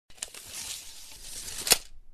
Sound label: tape Measure